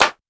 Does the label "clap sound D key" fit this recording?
Yes